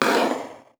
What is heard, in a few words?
Hard Slide Decelerate